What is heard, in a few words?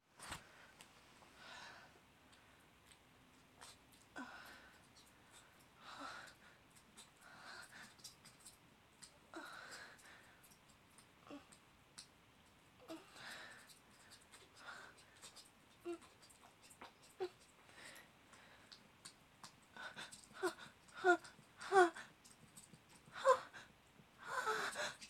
Wet and Whimpery girlie noises wet sounds squirting multiple orgasms; F4M